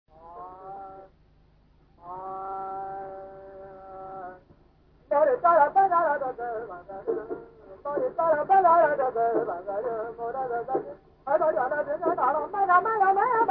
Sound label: indian vocal; zila